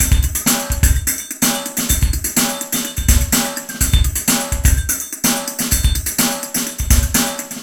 PHASE PHOL 116, LOOPS

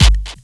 HI+LO SNR, TECHNO; Crypton - DrumBase5000; TECHNO #2

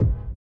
STREET; Spectrasonics; Metamorphosis

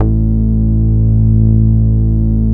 MOOG A2P; 90S MOOG

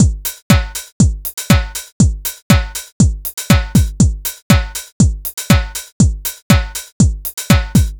120 trance beat, Drum & Perc Loops

